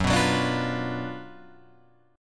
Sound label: F sharp Major 7th Suspended 4th Sharp 5th, Guitar, Open F; 6,6,6,9,8,6; strummed